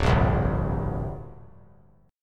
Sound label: D 7th Suspended 4th Sharp 5th down, chord; D7sus4#5 strummed